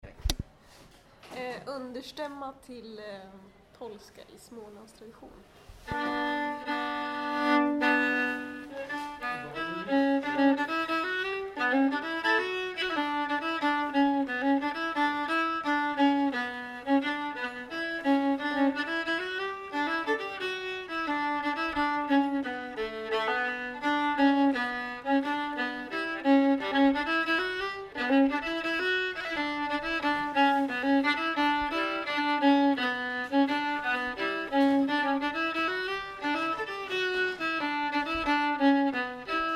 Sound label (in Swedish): polska i smålandstrad. understämma; Smålandstradition 2018